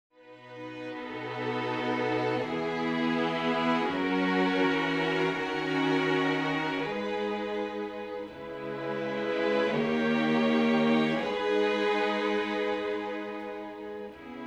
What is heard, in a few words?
Strings